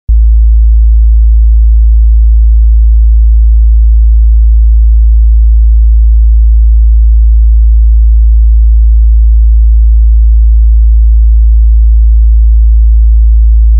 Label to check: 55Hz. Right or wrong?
Right